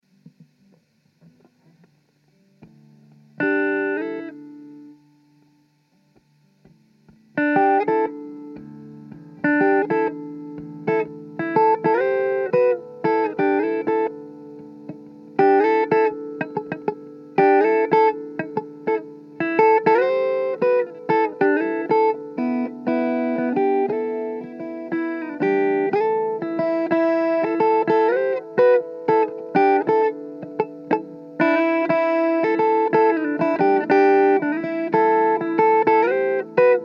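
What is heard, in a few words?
Am 120 guitar Jazzy Riff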